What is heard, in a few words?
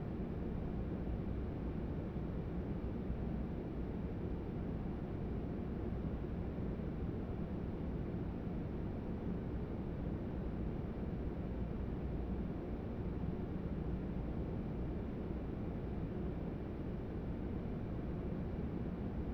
ambience